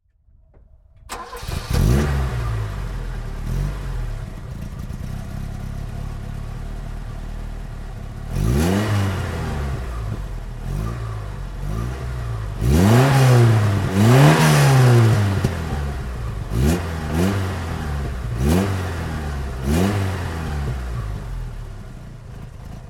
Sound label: Lancia Montecarlo 1980